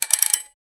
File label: office